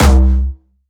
Distortion Kicks, Bassdrums

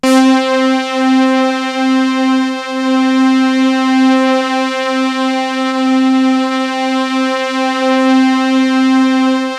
SYNTH LE; MORPHEUS; Syntec; Wall of Sounds

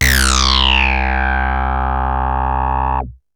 RESO SCRATCH, poly 800; TECHNO-BWARE

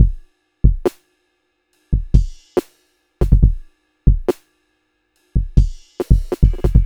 Tip Tap Drums; Sonic Foundry (Sony Creative Software) - Cinematic Funk Urban Tone Poems; Master Zorn

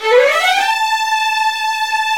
STR UP, Vlns Bow FX, Runs; Roland - String Master Series; Vls Runs

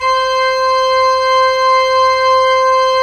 ORG P. ORG; Roland - LA Composer vol. 1

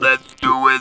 TB LET, Talk Box

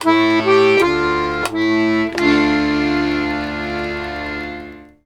accordions; USB Soundscan vol.40; AKAI

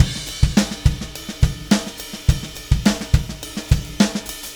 Beat Crash; Session 02 - Clinical

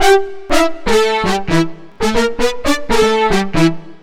Brass, Keyboards

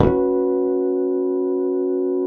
FRTLSS, Bass; Sampleheads; Will Lee Bass Library; F JZZ

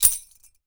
YAQUI; AKAI S6000 CD-ROM - WorldSounds

